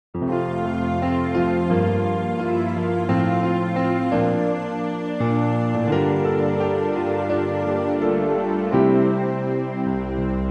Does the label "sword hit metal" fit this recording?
No